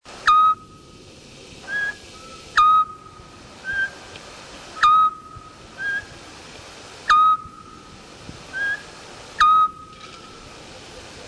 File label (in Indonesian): suara burung hantu rusia otus scops